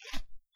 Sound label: hemostat retrieve; garrysmod; item ifak